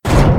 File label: hit, pong